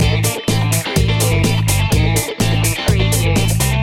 disco funk loop

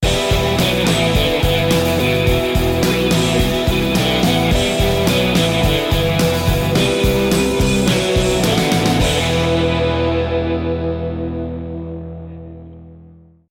indie style sting

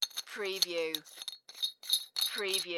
Metal Cap Screw Off

